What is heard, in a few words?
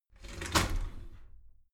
shower slide door inside open regular halfway